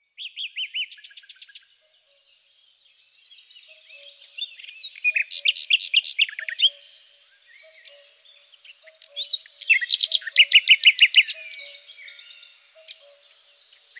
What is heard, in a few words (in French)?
ROSSIGNOLS Rossignol philomèle